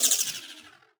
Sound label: Tech, Weird Side Samples